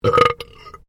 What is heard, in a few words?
MALE BURP LOUD; Male Vocal sounds; Burping; Human